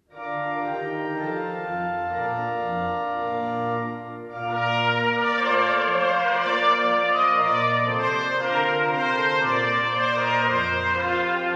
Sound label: trumpet voluntary